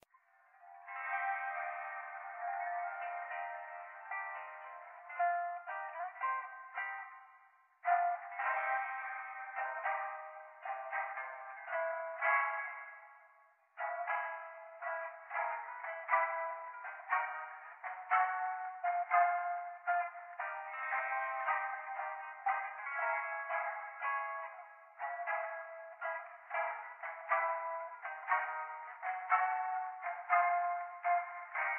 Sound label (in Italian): grottesca muffled